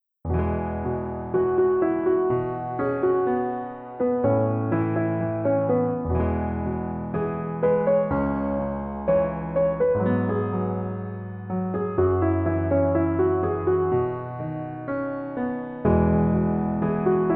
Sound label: Piano